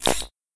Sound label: Grid Force